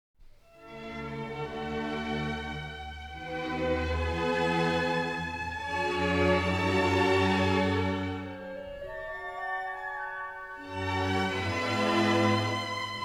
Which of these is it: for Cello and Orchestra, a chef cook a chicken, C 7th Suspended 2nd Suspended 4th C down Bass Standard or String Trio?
for Cello and Orchestra